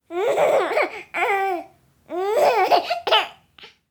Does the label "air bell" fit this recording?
No